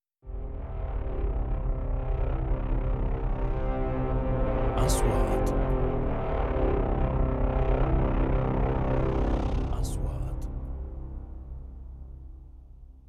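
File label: 3D SFX Trailer; مؤثرات تشويقية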